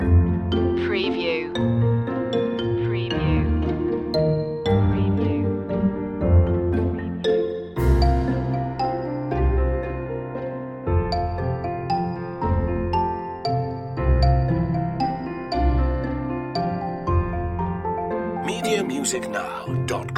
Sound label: a lovely dance loop